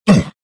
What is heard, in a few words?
jump; Star Wars Jedi Knight II Jedi Outcast; XBOX; desann